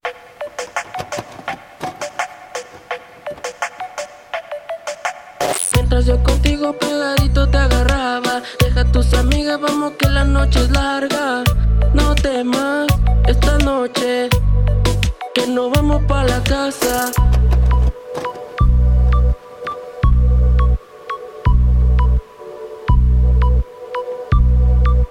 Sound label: trap